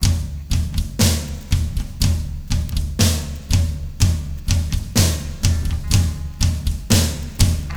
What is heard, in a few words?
Evil, Drums; EvilSpeakDrums